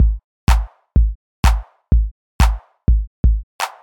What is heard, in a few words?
deep house drums; FL Studio